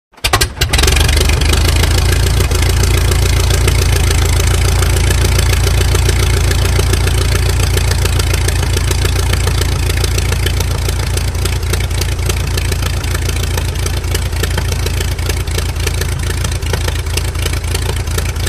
Tiger Moth: Manual Start / Idle / Off; D.H. 82 Tiger Moth. Brief Sputter, Start And Steady Idle, Off. Close Perspective. Prop Plane.